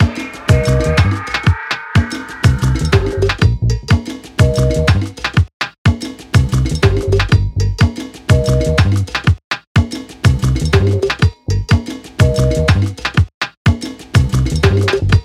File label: AfroDub; Launch Pad Series Vol 33; AfroDub Connection; renegade audio